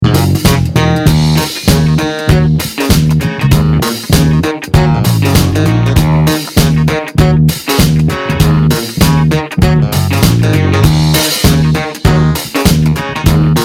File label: Rock Pop Function Band; London